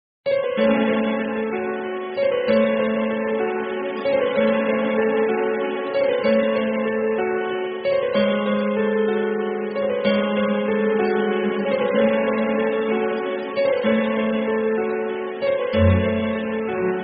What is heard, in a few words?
piano